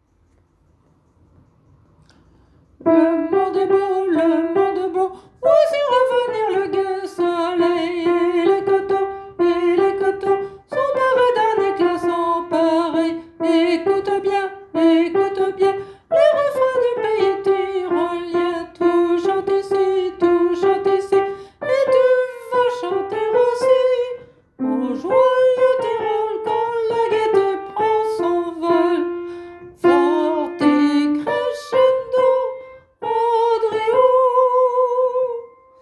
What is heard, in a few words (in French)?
alto basses